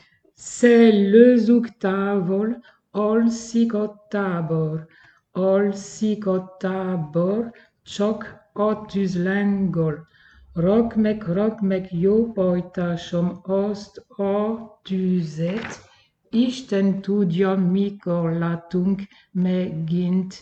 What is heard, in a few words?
tenor